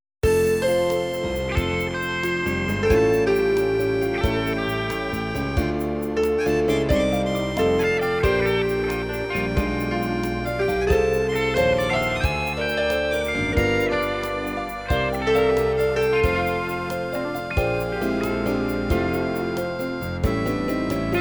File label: Mainstream Jazz Studio Sampler